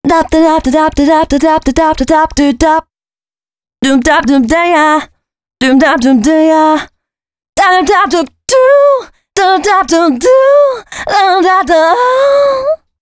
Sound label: female c#, Dance Ultra Voice FX; Mutekki Media